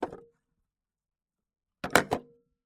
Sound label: siemens fg stat 23a 1956 handset picked up and set down roughly in cradle; sonniss gdc